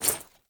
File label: Generic Footstep; Warframe; Uriel